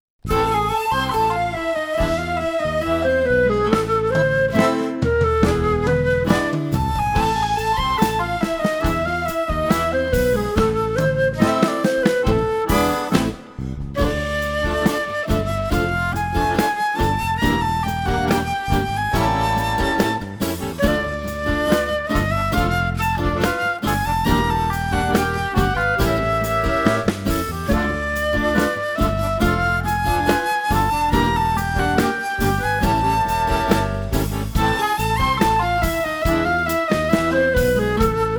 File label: Tango del Gaucho Flute